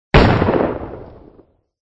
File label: gun